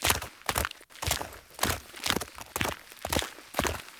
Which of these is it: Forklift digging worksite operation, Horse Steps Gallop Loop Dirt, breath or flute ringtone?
Horse Steps Gallop Loop Dirt